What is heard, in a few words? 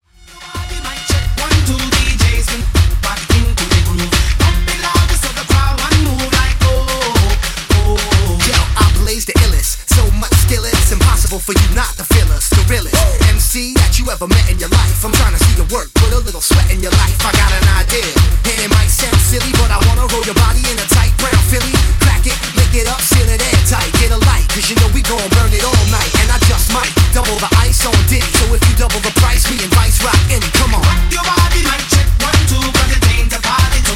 Wordplay Party Segue 109 Bpm Clean; djyan